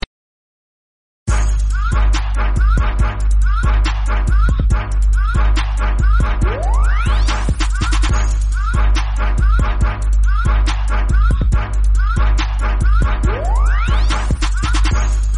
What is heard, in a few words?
electro trap